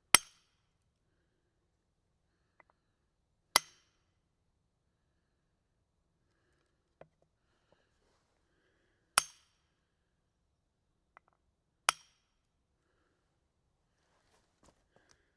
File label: hitting wedge with maul